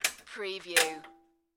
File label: Dryer Dial; Sound Effects Bible